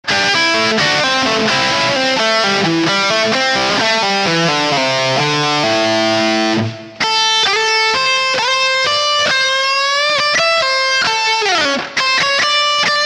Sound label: lick slomo; workshop